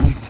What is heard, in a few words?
beatbox, Kicks Soft